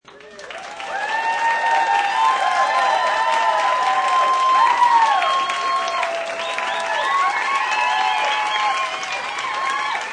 Group Cheer Hoots, Cheering, applause & bar ambience, group, whistling; Crowd